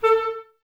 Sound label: Clarinets, Wood Winds; Miroslav Vitous - Woodwind Brass Ensemble